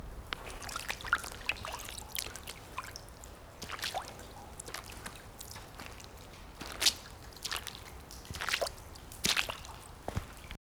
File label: Walking on puddle; SFX; IRL Recorded